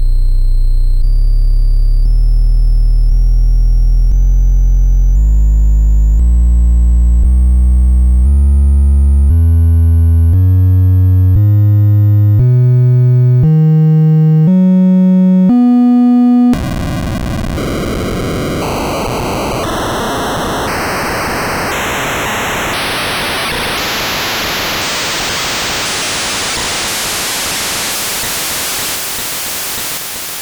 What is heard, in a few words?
pal pitch test, nes